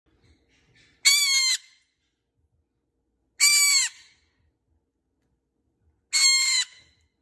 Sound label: Green cheeked parakeet; طوطی گرینچیک